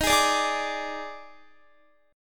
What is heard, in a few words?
Eb Major 7th Suspended 4th, Guitar; EbM7sus4 strummed